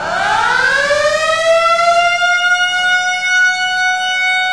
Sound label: city civil alert loop